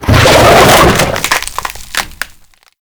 Infested Jetpack Charge; Warframe

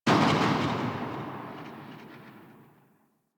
Assault Rifle Tail; Weapons; Warframe; Grineer

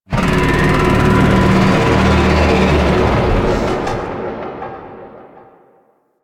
Void Dragon Vocal Idle; Warframe; Duviri; DuviriVoidDragon

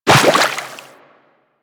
Walk Water, Footsteps; Warframe; Operator

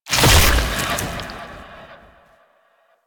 Shield Impact One; DE; Warframe; Warframes; Garuda

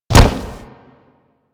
Step Metal, Footsteps; Warframe; Grineer

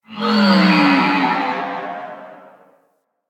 Ship Exterior Creature Calls; Ambience; Warframe; Corpus Ship Remaster

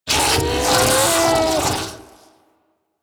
Pain, Infested; Enemies; Barks; Warframe; Ancient Shell Agent

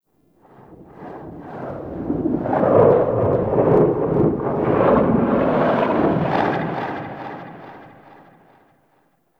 Ext Rumble; Ambience; One Offs; Red Night

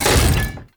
UI Scrap Container Unlocked; Warframe; Missions; Zariman; Zariman Assassinate

